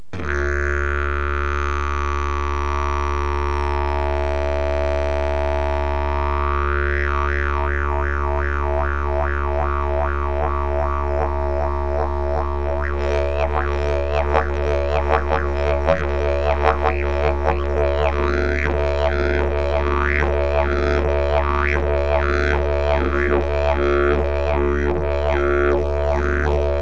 jilm podzimni, didgeridoo

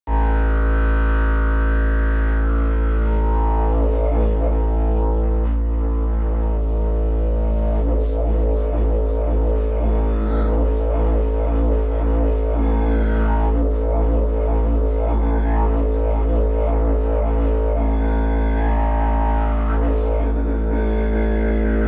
jilm, didgeridoo; jilm demon hlubin

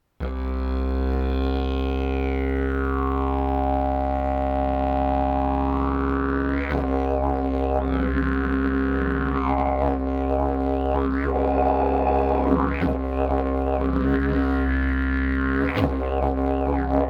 javor strom, didgeridoo

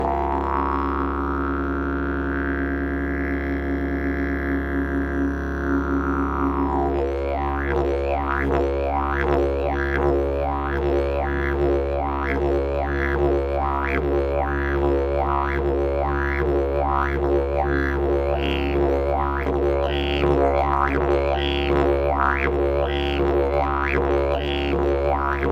olse, didgeridoo; rudoradost

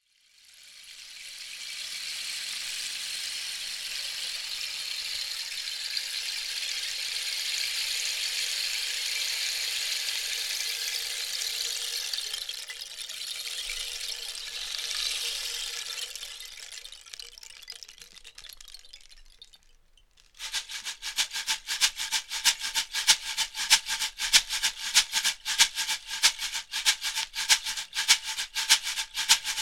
destove hole; 3 druhy

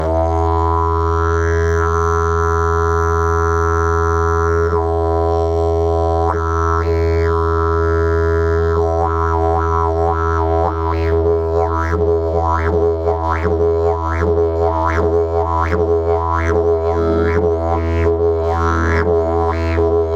jilm koren, didgeridoo

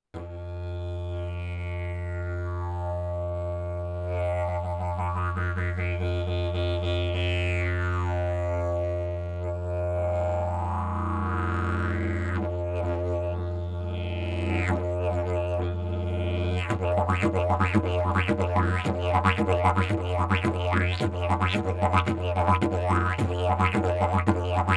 jilm, didgeridoo; jilm art

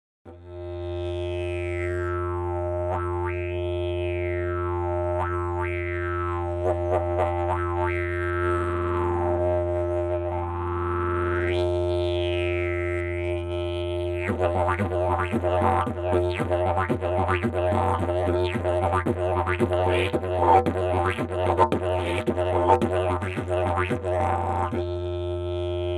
javor hnedy, didgeridoo

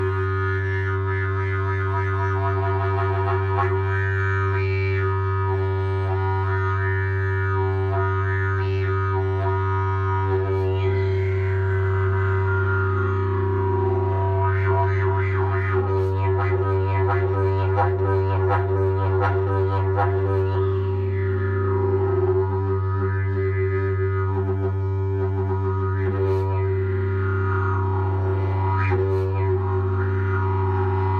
jasan, didgeridoo; jasan elegan

